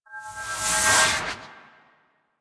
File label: Melee Heavy Attack Large Charge, Weapons Melee Whooshes; Warframe; Heavy Attacks